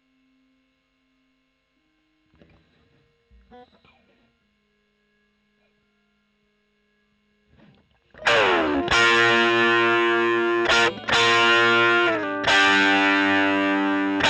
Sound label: E.GTR Gefell OD